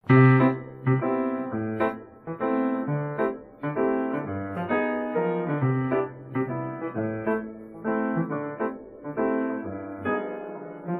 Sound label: piano